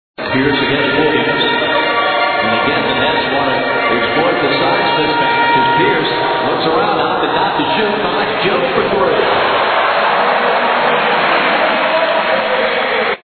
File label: stadium Sound